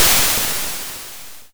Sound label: Snare, YM, CHIPSHOP HITS DRUMS; ChipShop Deluxe